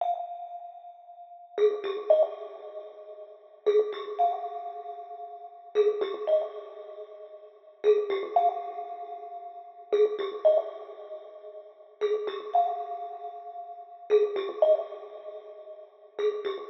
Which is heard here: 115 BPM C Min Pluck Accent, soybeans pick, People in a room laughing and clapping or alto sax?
115 BPM C Min Pluck Accent